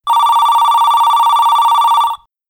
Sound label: ring; Gizmo Project 4.0.4